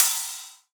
Acoustic Drums - Ride; Heavy Acoustic Drums; Antidote Advent

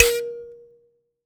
Finger, Prepared Kit; Battery 3 Sample Kits

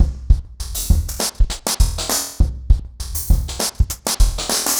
Remixed Drums 100BPM; The Roots Drumloops

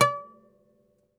OUD C#4, Plucked Strings; E-MU Producer Series Vol. 7 – Old World Instruments

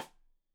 Side Stick Stereo; Deeply Sampled Drums

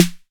808 SD Tape, Snare Drum; Tape808; Goldbaby Tape808 & Tape909

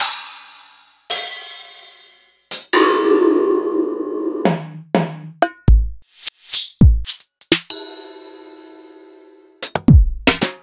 EMU Orbit

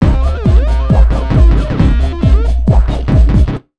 Big Beat Drumloops, LOW 135

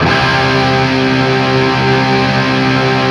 open C, guitar; East West; Steve Stevens Guitar Samples Collection

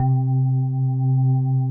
HOLLOW ORG; Greytsounds; Scott Peers Sound Engineering

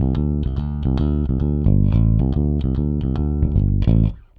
Rock, Bass; Bass X

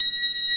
B3 C 6, organ; VOCAL ORGAN; ORGAN #2

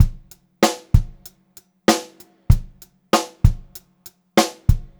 BEAT, Pop-Rock Drumloops; USB Soundscan vol.37; AKAI; 96 POP

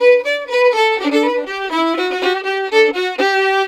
120 D violin, Celtic Flavours; USB Soundscan vol.42; AKAI

